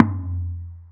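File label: TOM soft; Zero-G; Chemical Beats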